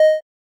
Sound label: elevator beep; Golden Crayon Sounds